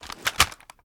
ar raise up, weapons; viper

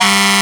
fire truck horn; gmod; perp2.5

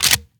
rechamber, sh; reloads; iw8; romeo870; gmod

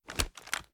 reload fast xmag end; gmod

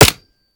fire last, weapons; gmod; viper

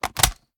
reload empty fast magin; reloads; iw8; uzulu; gmod

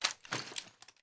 ar reload twist, weapons; viper

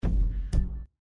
open menu, pause menu; gmod; paris

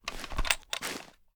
reload mag raise; gmod

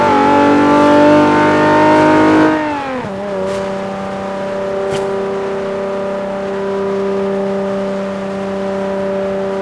fourth cruise, vehicles; 370z

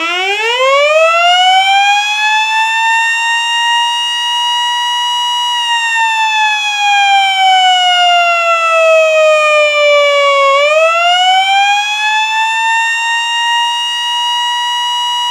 firetruck wail old; gmod; vcmod; els

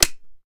reload fast mag in, weapons; gmod; viper

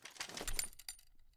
ar idle active move, weapons; gmod; viper

